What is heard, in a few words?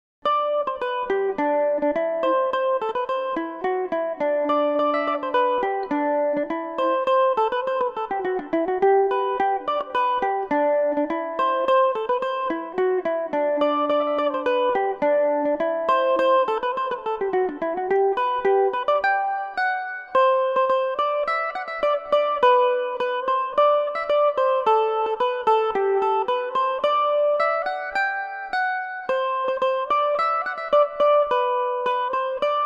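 polka G major revelation, irish mandolin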